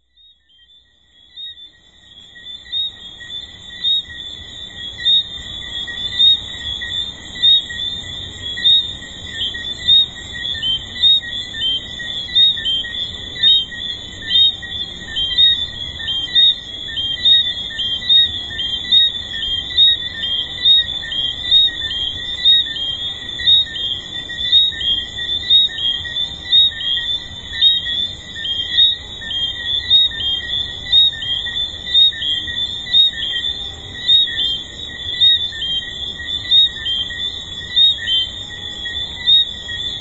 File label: tree frogs